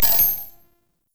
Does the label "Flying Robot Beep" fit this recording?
Yes